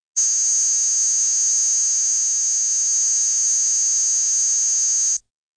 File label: Beeps; 헐리웃특수효과음; 신호-경적